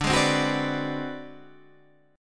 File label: Ab 7th Sharp 9th Flat 5th D, chord, guitar